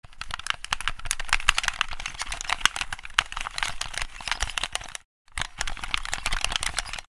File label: Joystick buttons press multiple times quick